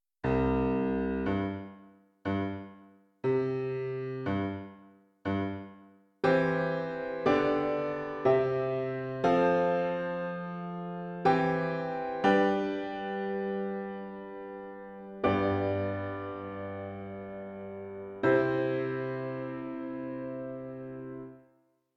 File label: Piano